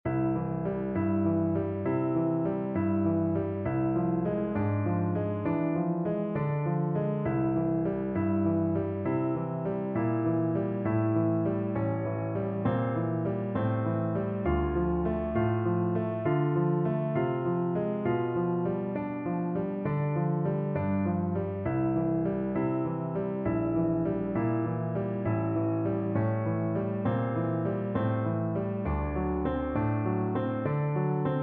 Miniature, piano

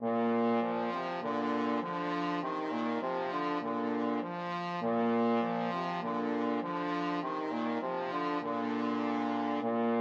alouette trombone duet

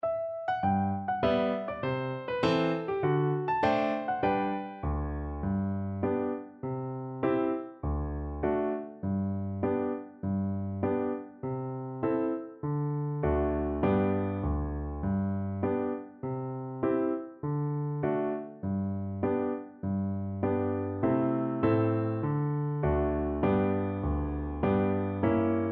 Country Gardens for Flute

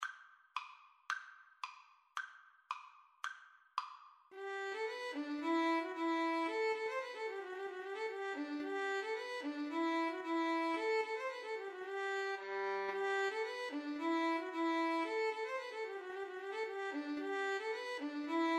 violin cello duet; La Rose

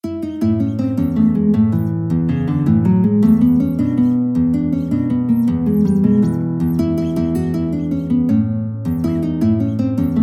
mexican hat dance, guitar

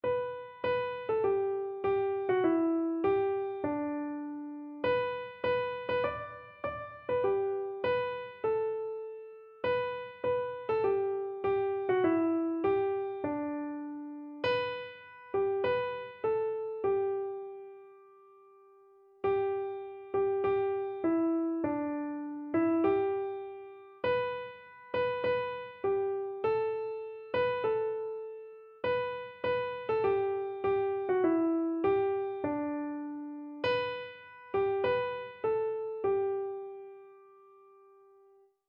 glory to his name, keyboard

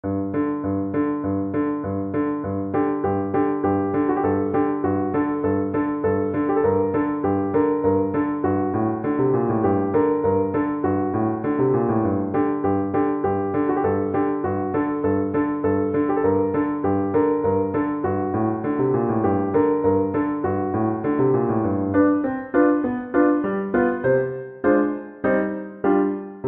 danses des cygnets, oboe

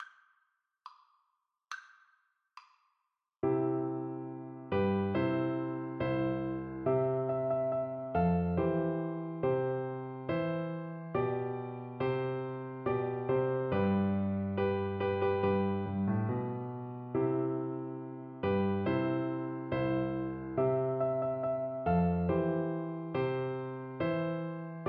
Masterpiece Theater flute

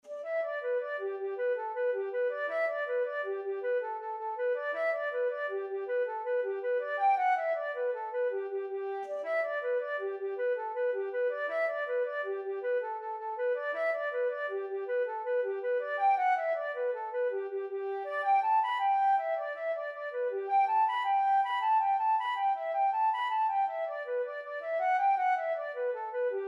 flute

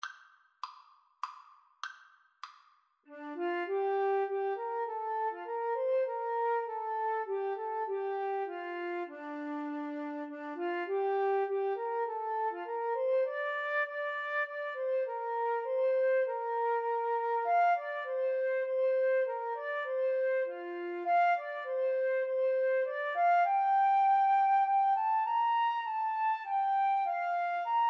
jupiter, Flute Cello Duet; holst

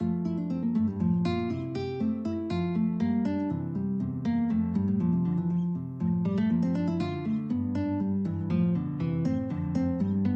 Allegro in F, guitar

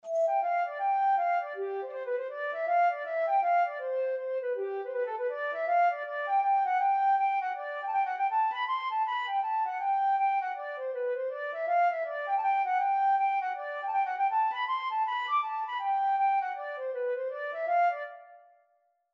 over the hills, flute

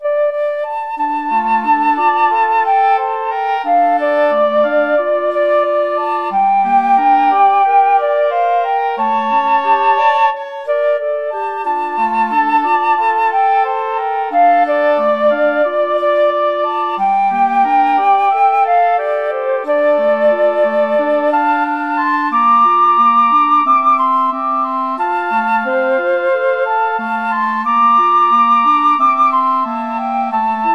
flute oboe clarinet

